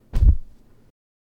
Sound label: Pillow